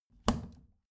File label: plastic trash can close regular muted